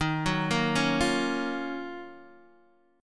Eb Minor 9th, down, Guitar, DADGAD; arpeggiated; chord; JGuitar

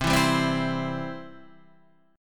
C Major, C, down, Guitar, Half Step Down; chord

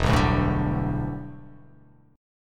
E-Minor 11th, Em11 chord; strummed; JGuitar

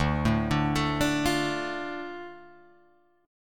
D Minor D Guitar Drop D

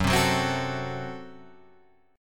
Gb Diminished, Guitar Open G; chordshape; down

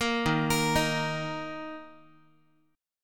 Eb 5th, Guitar; 13,11,13,13,x,x; JGuitar

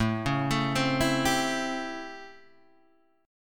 A 7th Sharp 9th, Guitar, Open G

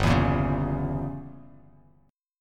G Diminished 7th down Bass Standard; strummed; JGuitar

